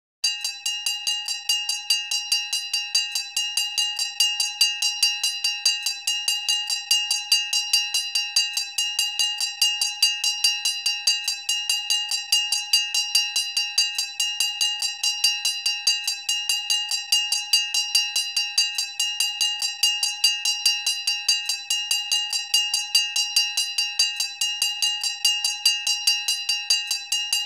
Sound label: bells